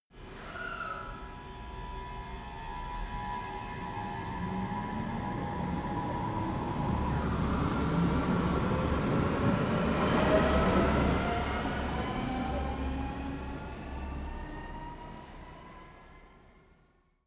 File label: train underground station depart